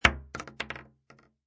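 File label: Bullet Shell Drop Shotgun 12 Gauge Hollow Wood; Bullet shell drop: empty Shotgun 12 Gauge shell falls on hollow wood; Impact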